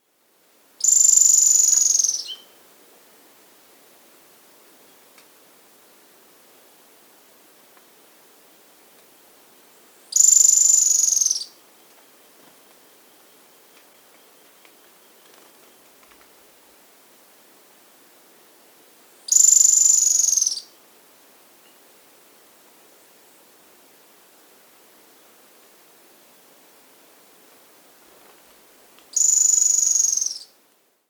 Spotted Barbtail (Premnoplex Brunnescens), Andean Birds; S. America